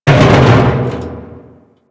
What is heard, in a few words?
Metal Drum, Physics Objects